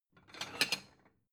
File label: plate small take slide